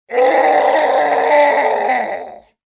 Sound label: yell